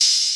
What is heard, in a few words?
Open Hats